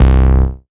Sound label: Bass C, Offbeat Bass